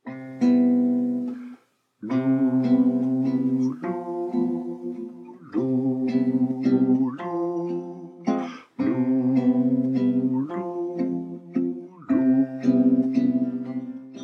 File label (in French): la recette basse, chorale